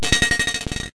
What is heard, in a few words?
coin; Q*bert